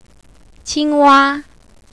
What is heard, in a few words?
frog